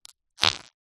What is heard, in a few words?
cartoon fart